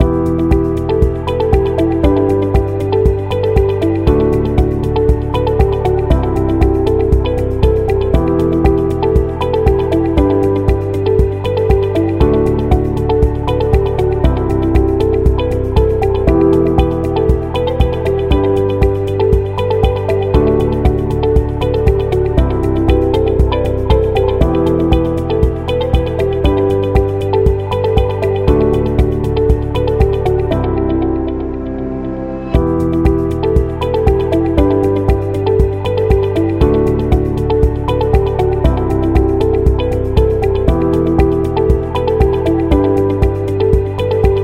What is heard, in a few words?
Corporate Loop Positive Motivation; explainer